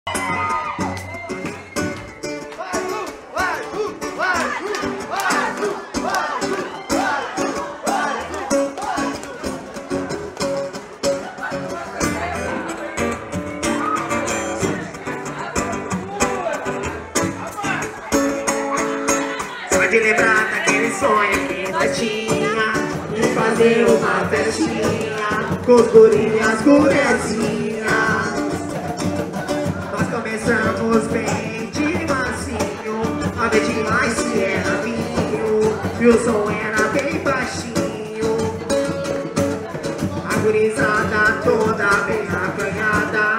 samba ao vivo

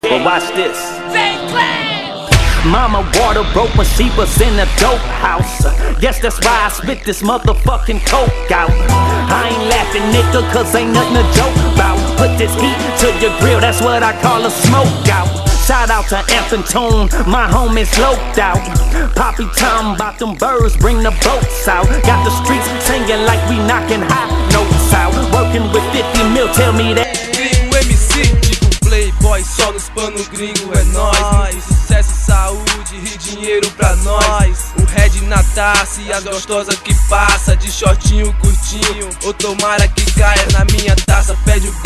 remix rap bahia feat hip hop

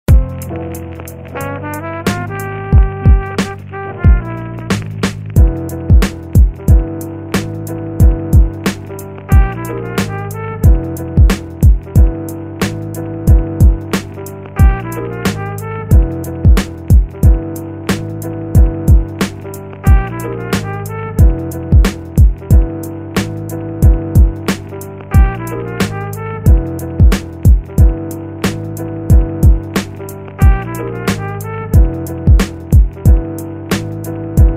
1 pouco de jazz instrumental

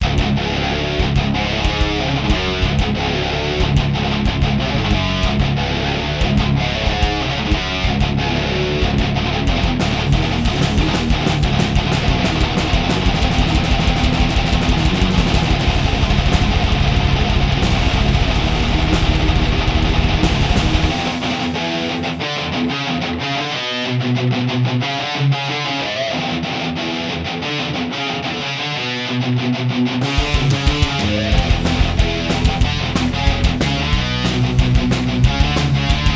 palhetando no piano roll

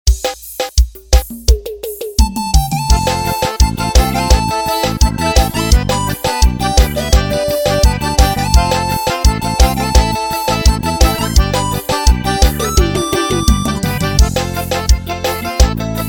pisadinha playback

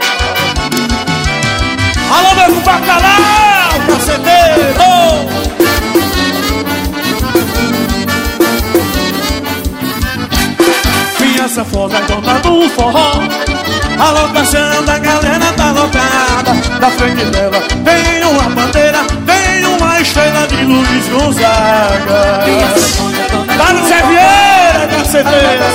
acordeon forro ao vivo

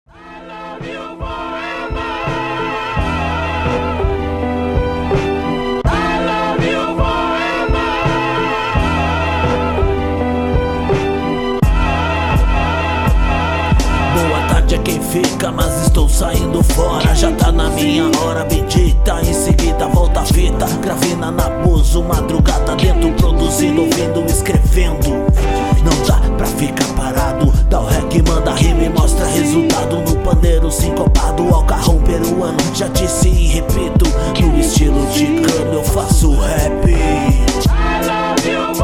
rap

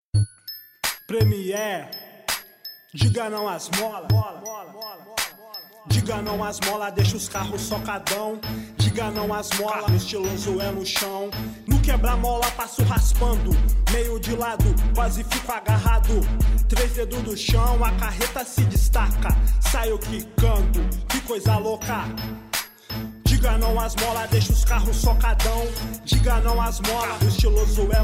rap gospel rap automotivo hip hop

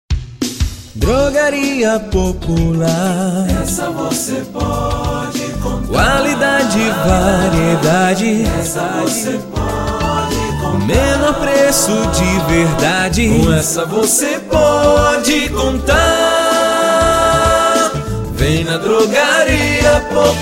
jingle drogaria; popular

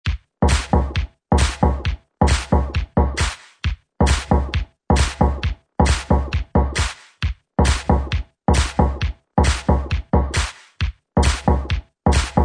dance music, soul de verão extend

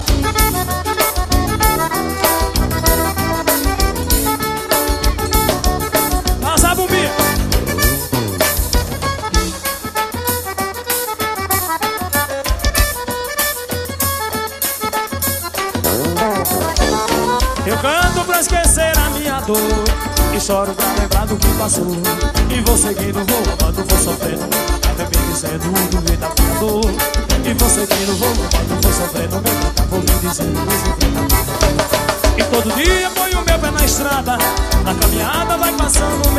acordeon

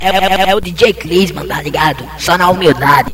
vinheta dj

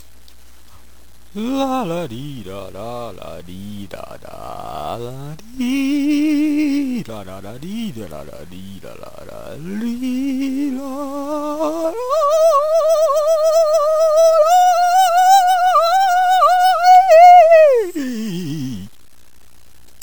teste vocal

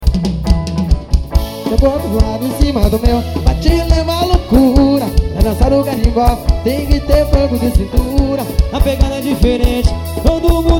carinbo swing

